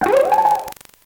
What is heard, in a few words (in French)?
Cri